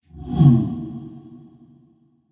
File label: Future Vroom, whoosh sound effect; Future Vroom 10